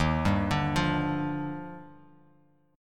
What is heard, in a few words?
D sharp Major 9th; arpeggiated; 11,10,12,10; JGuitar